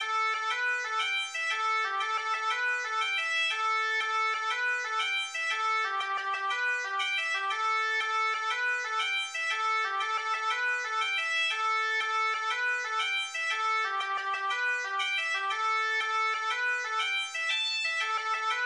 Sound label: Jig; Easter Egg